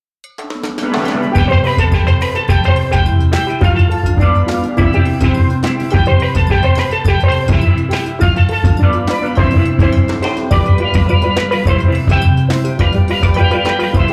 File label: Caribbean Steel Drum Happiness